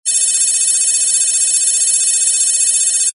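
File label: communication telemetry data readout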